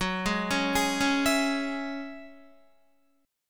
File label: Gb 7th Suspended 2nd, Guitar, Gb7sus2 chord; arpeggiated; JGuitar